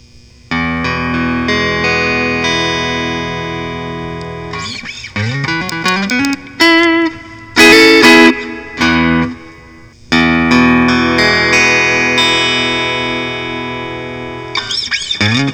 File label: fender telecaster blonde transparent